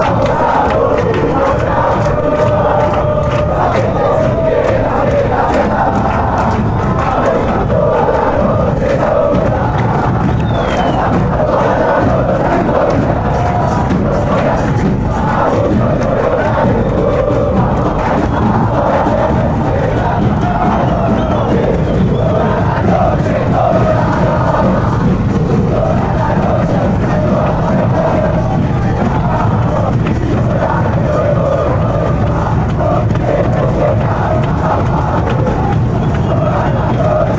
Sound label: millonarios chant; mfc